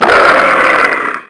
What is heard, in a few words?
roar